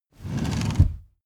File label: car seat slide backward fast